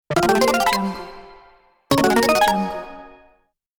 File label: level up sounds